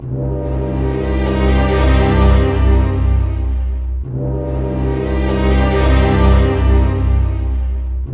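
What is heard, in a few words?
60 dark, orchestra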